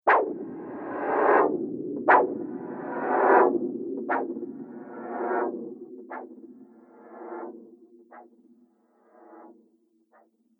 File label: Magic Surreal Soundscape; Magic Sound Effect